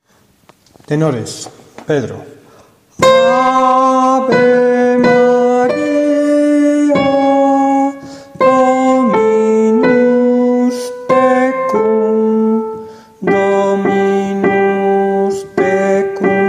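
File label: tenor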